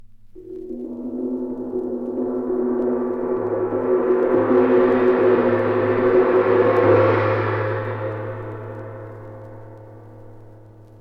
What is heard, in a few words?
ghostly gong sound reversed